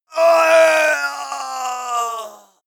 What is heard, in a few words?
male death cry; schreie; menschen; soundpool